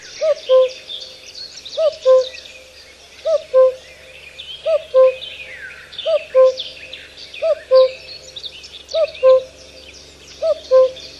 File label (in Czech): zvuk, ptaci; jaro v prirode; nasi ptaci